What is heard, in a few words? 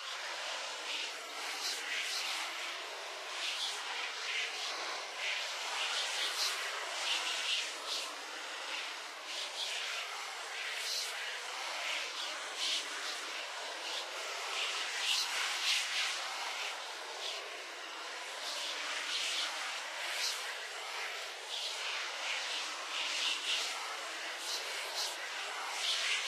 whispers